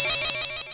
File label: Hard PCM Chip; chiptune